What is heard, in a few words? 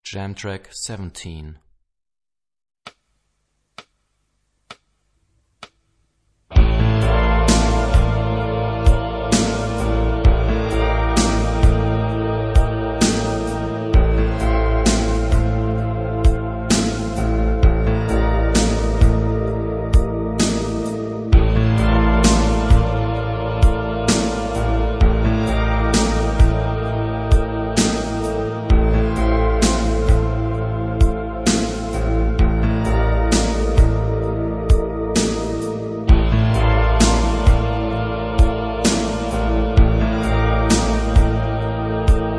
Rock guitar; Rock gt Secrets